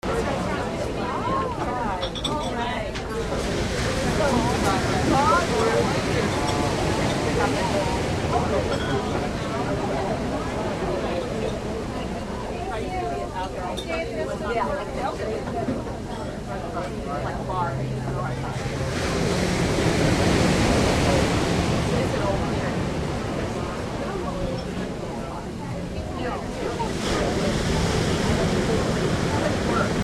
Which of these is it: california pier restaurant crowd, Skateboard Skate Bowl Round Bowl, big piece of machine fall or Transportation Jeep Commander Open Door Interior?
california pier restaurant crowd